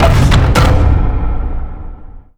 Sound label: match open, ui menu